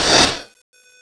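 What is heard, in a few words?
angry; flyclaw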